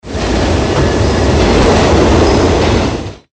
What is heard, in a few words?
SUBWAY